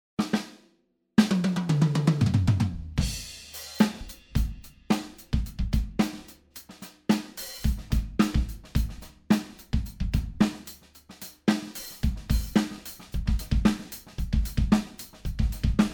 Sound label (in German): Fame Fortress Set2 mit 14˝x 6 5˝ Snare